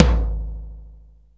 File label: tom, Yamaha RX-5